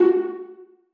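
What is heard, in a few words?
violas pizzicato f#3; Sonatina Symphonic Orchestra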